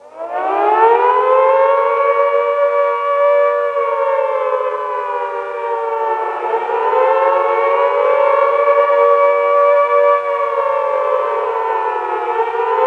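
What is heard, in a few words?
icbm missile alarm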